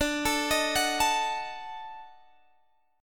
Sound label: Eb Minor Major 7th Flat 5th